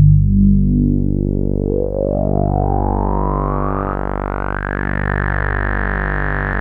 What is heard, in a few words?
Prophet 5 G1; Hollywood Edge - Giorgio Moroder Rare Synthesizer Collection